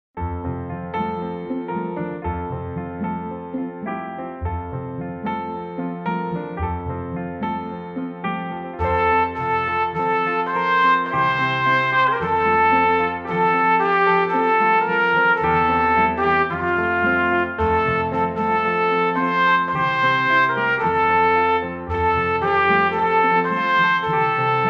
F, trumpet

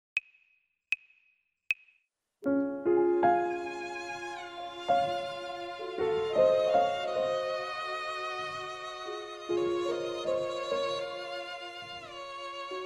violin

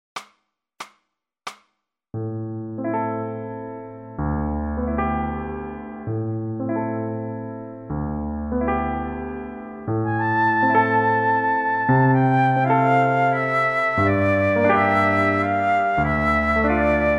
piano solo flute

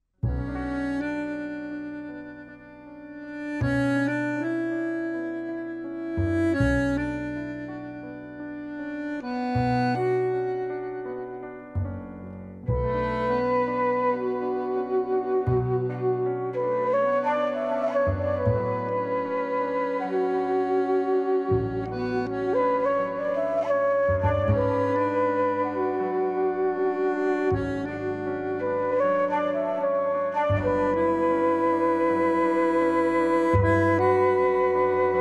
Bm trio play reference flute

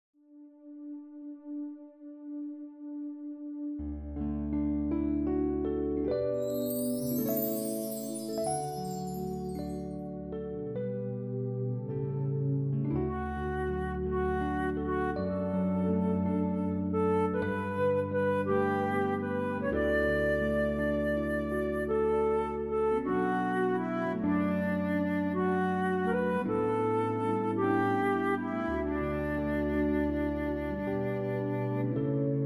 D flute reference

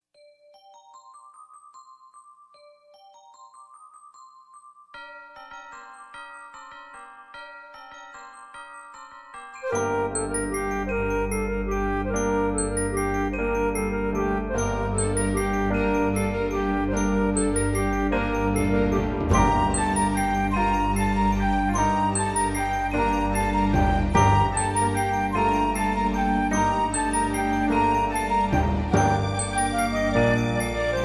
Gm play flute reference

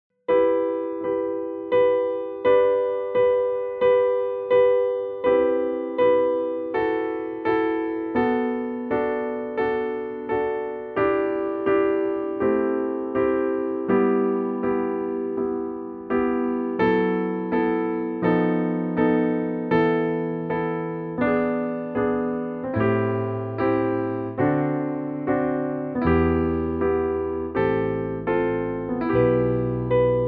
piano; clasica

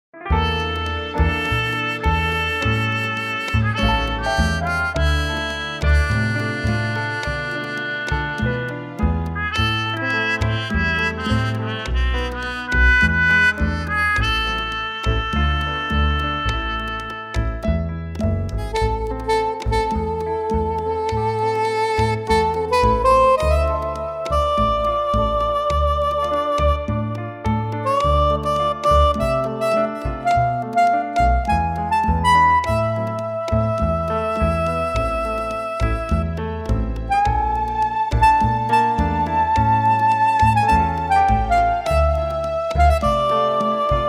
soprano sax

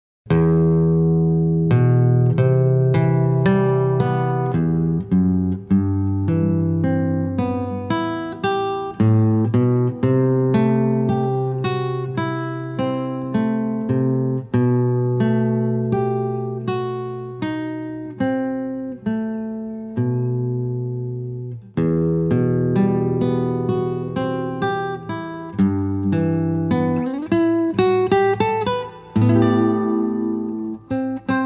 guitarra sola play

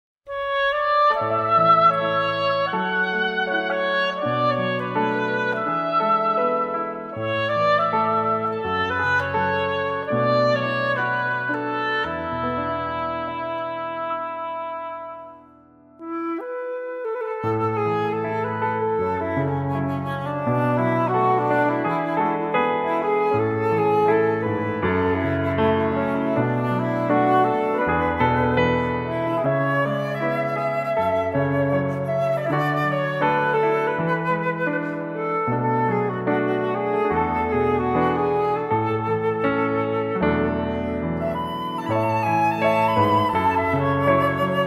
play flute

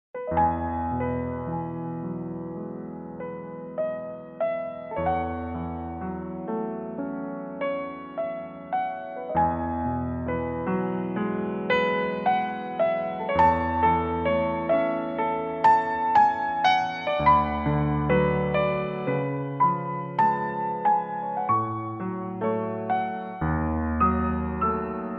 E play referencia cello

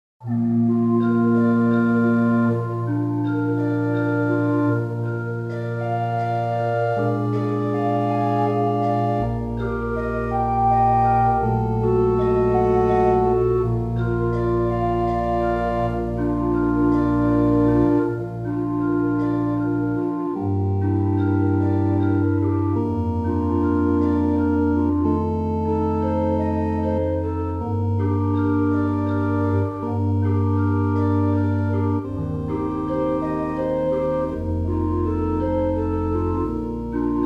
Ave maria Bb

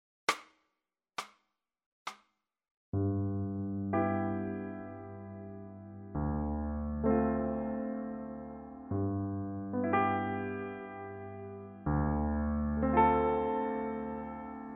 piano solo flute

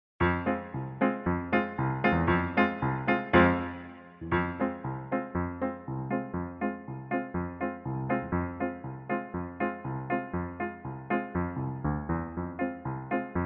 Bulgar Fm klezmer piano play

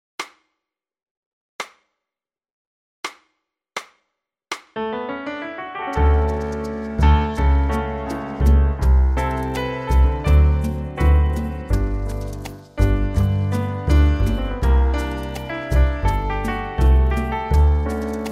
D play Referencia flute

